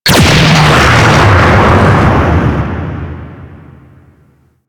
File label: Mine Projectile Explosion